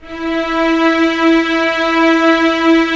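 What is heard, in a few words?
STR String Section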